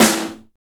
SNR TRASH, Snares; Roland - Rhythm Section; Snares 1; Sn Modules 1

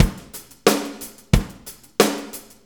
90 ROCK, DRUMS

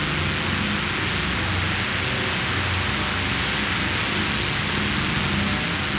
Panned Noise, non tempo loops

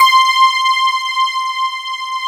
AGOGPAD C5; Big Fish Audio; Synth City

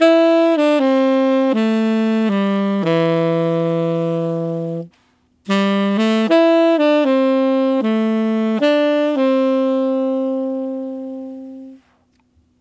Tenor Ballad in A min, Tenor Sax Loops; Sonic Foundry (Sony Creative Software); Jazz Solos and Sections

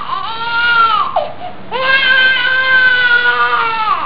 baby cry; Amiga Soundtracker Sample Packs

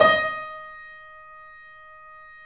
The Piano D#; InVision Interactive - The Piano

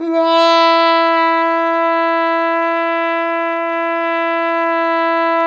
BRS TRUMPETS, Roland LA

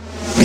REV SNARE; Techno Trance; Dance; E-MU Formula 4000 Series Vol. 2; Dance Set 2

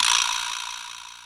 Korg N5 Rock Kit C#3

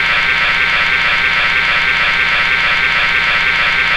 Drone; Metro

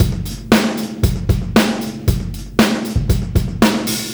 116, Beat Loops; Zero-G; Monster Beats

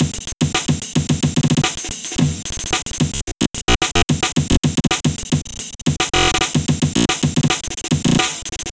amen; M8; percussion and foley

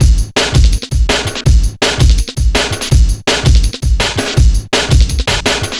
165, Drum Loops; 90 s Sample CDs; Total Drum&Bass

